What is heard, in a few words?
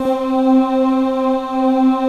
AHH PADS; Pro-Rec - Synthsonic